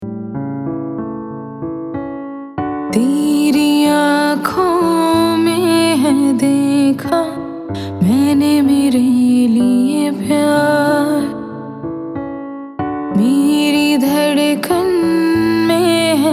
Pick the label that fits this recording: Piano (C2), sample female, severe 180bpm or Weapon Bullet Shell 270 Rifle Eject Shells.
sample female